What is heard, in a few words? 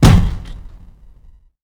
Door Closed Kick